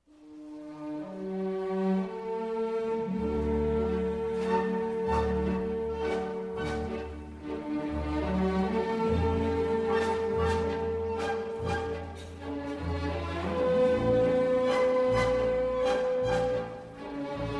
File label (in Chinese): 蓝色多瑙河; 初中午休结束; 广播室铃声系统